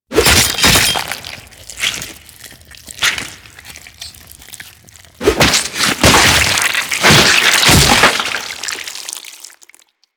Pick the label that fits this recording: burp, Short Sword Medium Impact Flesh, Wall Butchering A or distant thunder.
Wall Butchering A